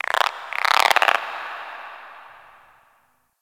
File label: Ocean Critter Frog; Warframe; Grineer Ocean